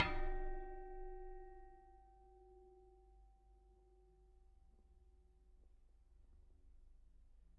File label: Bicycle Spoke